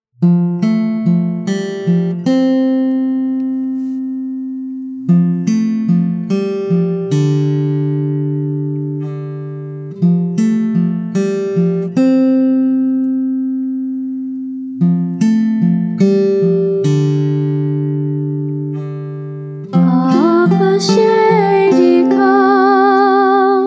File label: Island Baby (English)